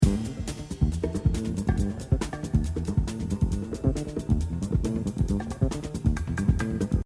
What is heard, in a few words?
loop mono